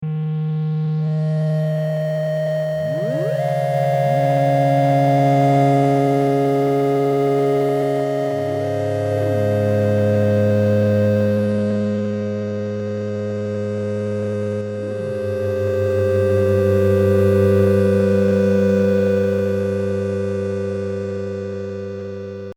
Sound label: soft Pad